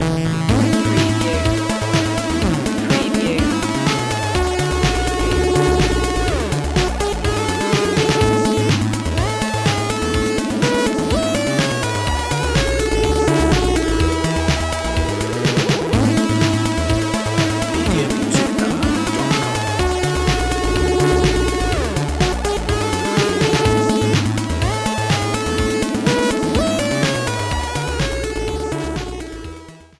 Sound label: retro video game, nintendo music